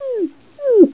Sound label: whine, dogz